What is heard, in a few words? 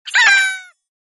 Squacky Hit